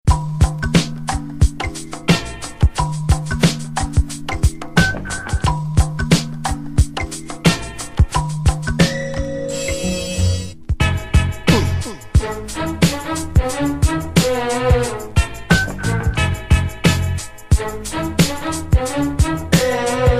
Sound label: Break